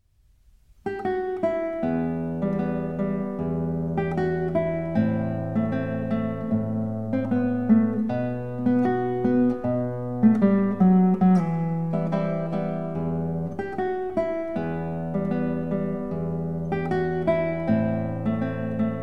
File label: habanera